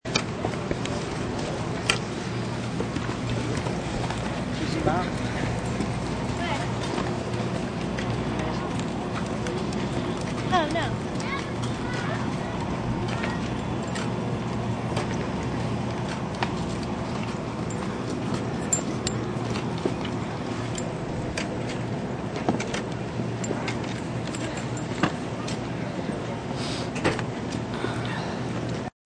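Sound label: Ski Resort Ambience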